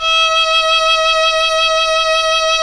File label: Synclavier Strings; ILIO